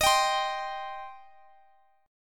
D sharp 6th, Guitar, DADGAD

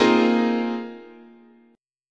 Bb 7th Suspended 2nd Suspended 4th, chord, guitar

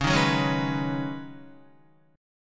Db 7th Suspended 2nd Suspended 4th Db Guitar; Guitar-4F,4A,5C,5G,5C,6E; Db7sus2sus4 strummed

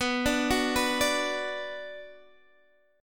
B Minor B Guitar Open D

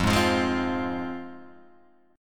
F Minor F down Guitar Open G

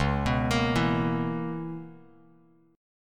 Db-Minor 13th Bass Standard; Dbm13 arpeggiated; 9,14,20,9; JGuitar

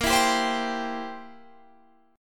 C Major Bb

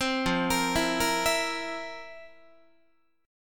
F Major 7th Suspended 4th F, Guitar; arpeggiated

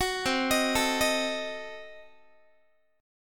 C Diminished 7th down Guitar; Cdim7 arpeggiated

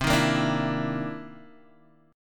C Minor 7th Flat 11th, chord; Cm7b11 Chord {X 3 1 3 3 0} strummed

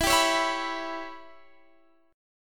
C sharp Minor 7th Flat 5th E

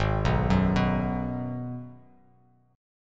Ab Minor 7th down; arpeggiated; JGuitar

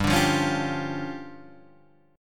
D Minor Major 7th Double Flat 5th, G

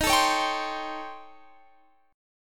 A 7th Sharp 9th Flat 5th

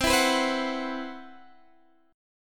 C Major 7th Flat 5th C, Guitar Standard; CM7b5 strummed; x,15,14,16,13,14; JGuitar

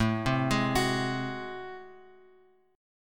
A Minor Major 13th A, Bass Standard; arpeggiated; AmM13; 17,15,18,23; JGuitar

